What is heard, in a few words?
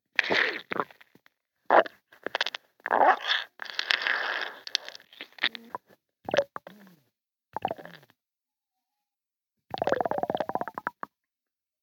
Bathtub Duck